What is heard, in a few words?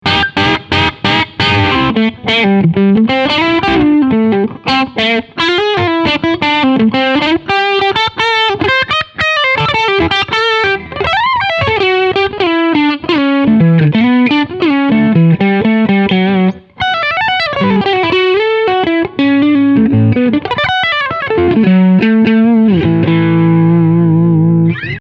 Medium Gain